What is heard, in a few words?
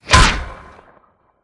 Sfx Baseball